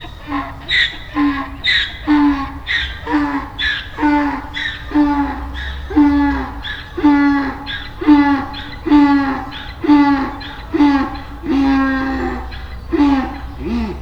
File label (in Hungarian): fehér szamár, magyar parlagi szamár; debreceni zoo 2012; marantz puska